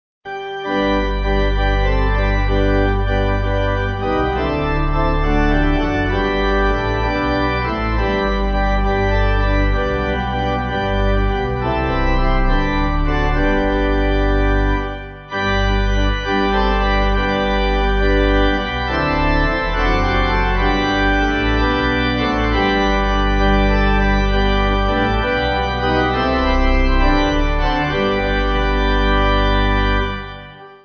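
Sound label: Pipe, Pipe Organ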